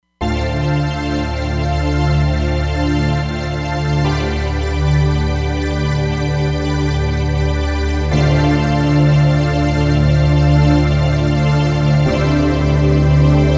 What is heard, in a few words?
Synth, Roland Fantom XR; Synth Force